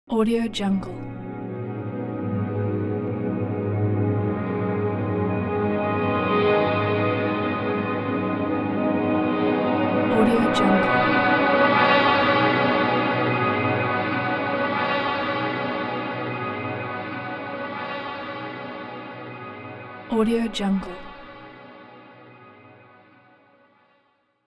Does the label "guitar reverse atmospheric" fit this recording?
Yes